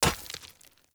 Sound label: Short Sword Medium Impact Rock; Player; Environment